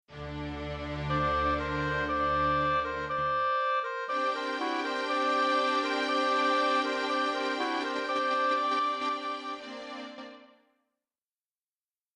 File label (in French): clarinette hautbois